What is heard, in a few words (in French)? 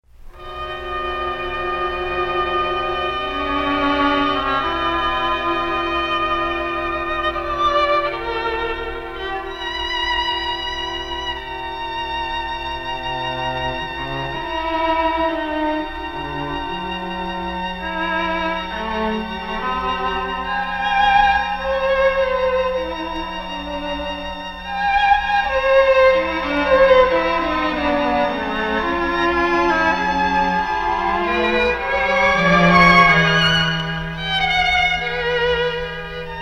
Quatuor à cordes; Ives; Discussions